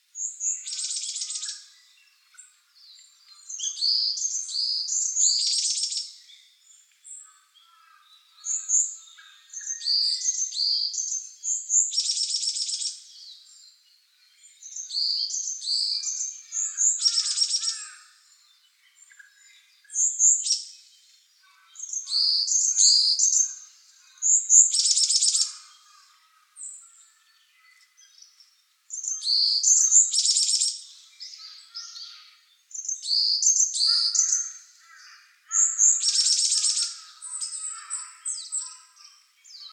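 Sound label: yamagara, varied tit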